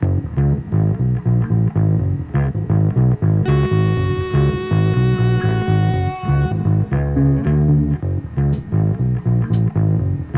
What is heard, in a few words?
bass; weeklybeats 2026